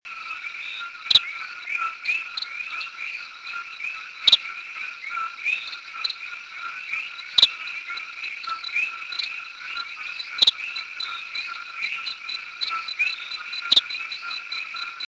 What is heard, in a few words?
Eleutherodactylus richmondi El Yunque National Forest Puerto Rico; very short trill composed of two to four notes; West Indian